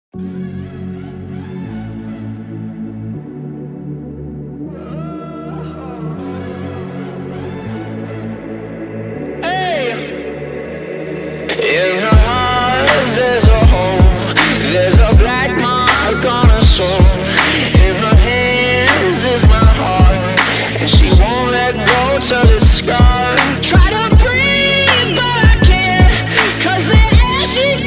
Horns

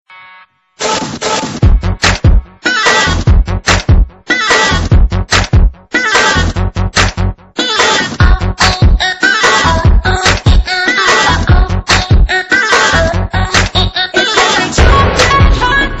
Desi Beats

